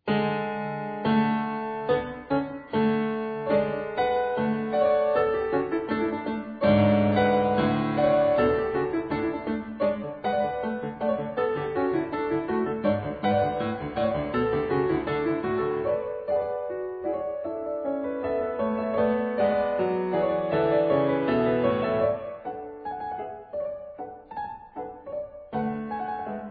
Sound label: Halling Piano; Nils Rekves; grieg